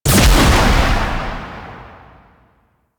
Crewship Missile Launcher Shot; Warframe; Vehicles; RailJack; Crew Ships; Grineer Crew Ship

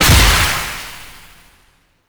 Projectile Death Tech Medium; Warframe; Weapons; Duviri; Incarnon Adapters

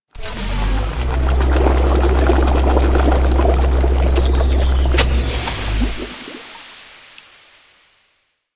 Venus Research Facil Vat Cooker, Ambience, Props; Objective Rooms; Props One Offs

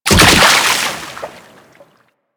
Kubrow Land Water, Footsteps; Enemies; Warframe

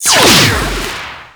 Teleport; Warframe; Captain Vor

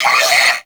Sentient Pain, Barks; Warframe; Sentients; Brachiolyst

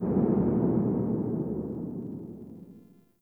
Under City Alley Cell Door Slam, Dungeons

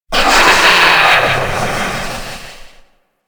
Generic Death, Vocals; Warframe; Orokin

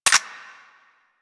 Tranquillizer Rifle Mech; Warframe; Tenno

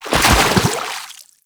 Roll Forward Foley Water D; Enemies; Warframe; Teshin

